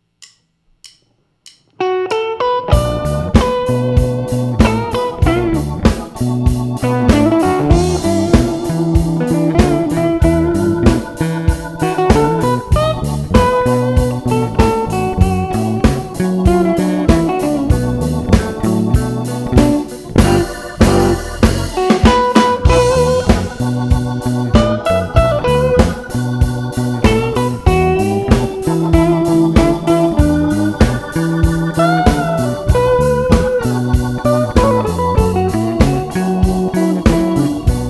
Soul Blues Groove

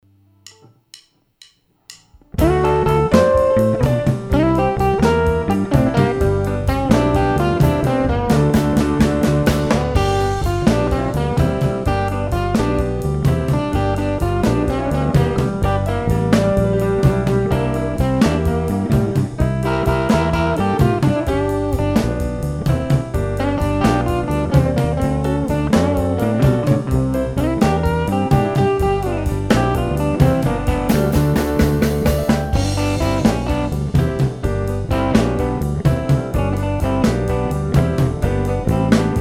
Rhumba with ending

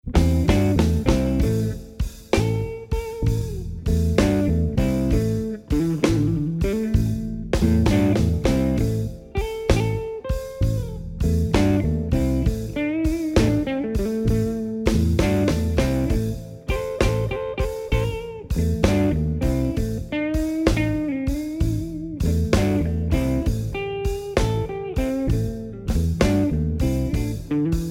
Chicago Blues style Drumming

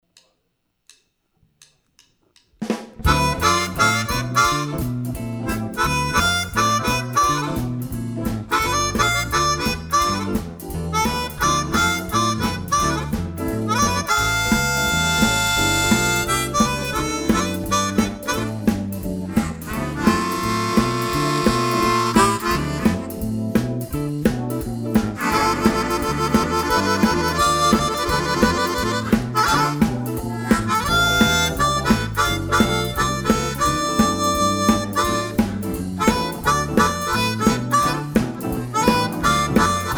Upbeat Swing

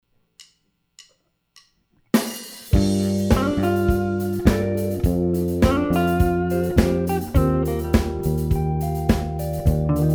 Soul Blues Groove

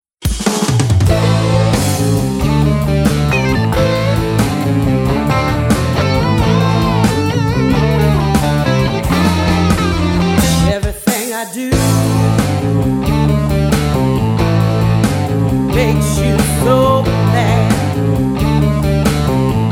Rock Blues

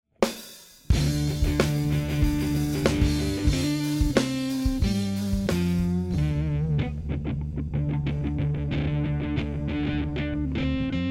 Rock Style Blues

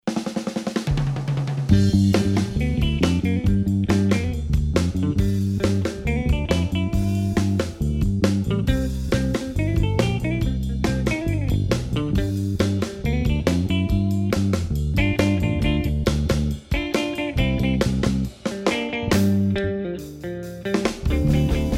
Surf Blues Drumming

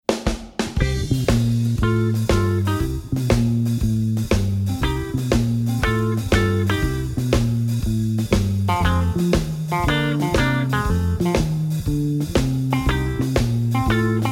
Texas Style Blues Drumming

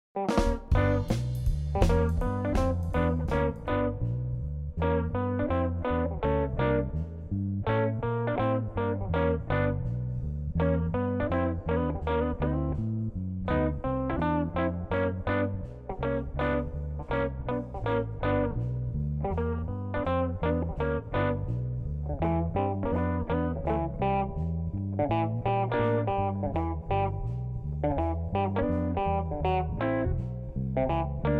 Swing Shuffle Blues Style play along

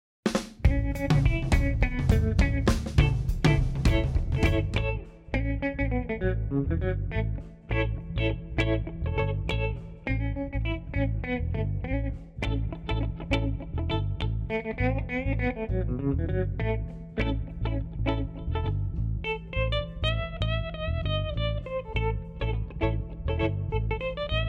Blues NO DRUMS

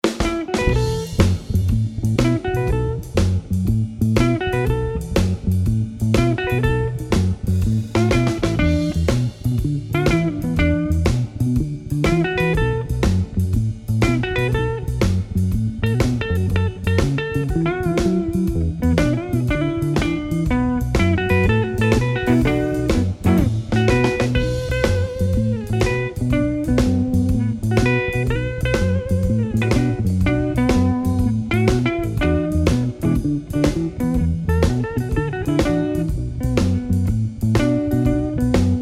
Chicago Blues Shuffle Drumming

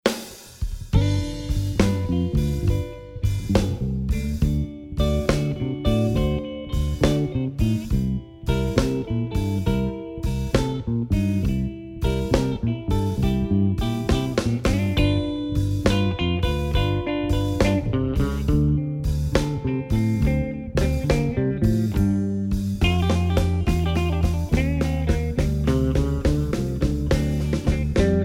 Eight bar blues Drumming

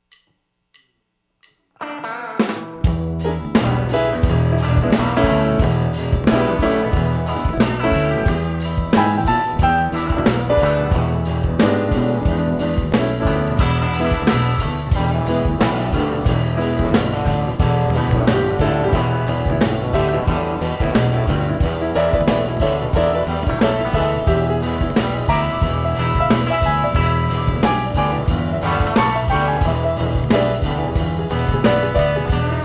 Delta Blues Drumming

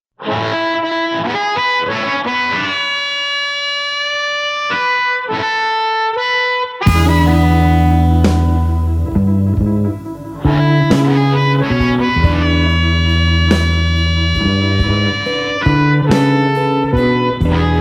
Traditional Slow Blues song

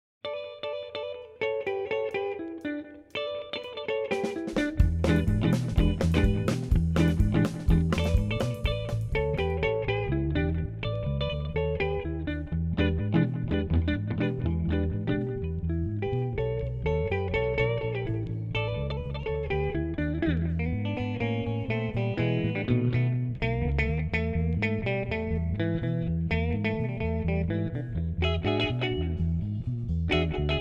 Mojo Blues Drums

